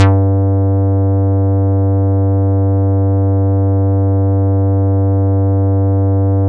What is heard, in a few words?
bass, synths